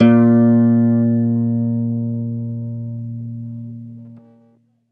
ZITHER BAS; F2; Best Service; Alpine Volksmusik

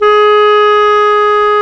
WND CLAR2, Clar Jazz

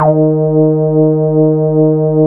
RESO D#2, BASSES; Best Service Alpha Dance vol.2

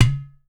UTAR, Definitive Percussion

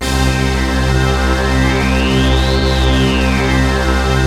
ENSONIQ VFX PLAY 1 NOTE; Rubber Chicken Software Sample Bank

